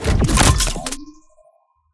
launchpad spawn; cstrike; pubg

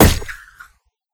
grappler impact; cstrike; pubg

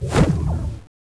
parachute land; cstrike; pubg

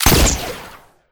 grappler shot; cstrike; pubg

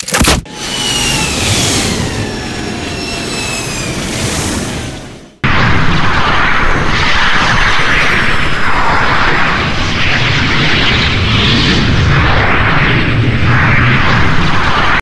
fire sound; cstrike; pubg

